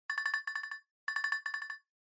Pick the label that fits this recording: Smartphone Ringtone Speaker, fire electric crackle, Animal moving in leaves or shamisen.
Smartphone Ringtone Speaker